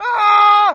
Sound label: Death Screams 5; 10 Male Screams, Various